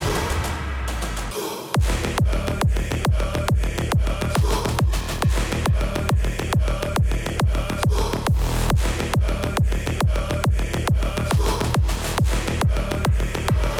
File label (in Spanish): PsyTrance Drop Mezclado; psytrance flp mixing and mastering; km music academy